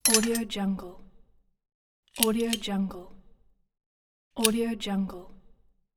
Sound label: mechanical